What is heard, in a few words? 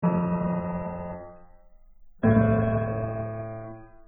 piano; piano test2